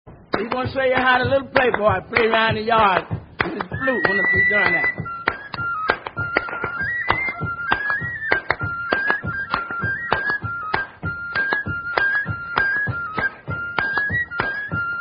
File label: Untitled Fife Tune With Clapping Accompaniment, Cane Fife; old mother hippletoe rural and urban childrens songs